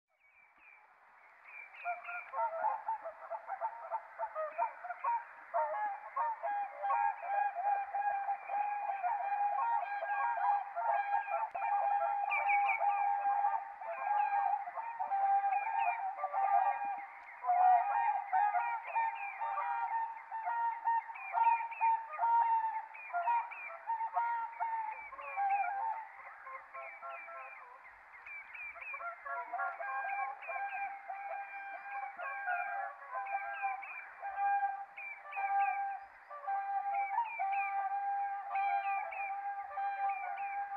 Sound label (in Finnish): laulujoutsenen ääni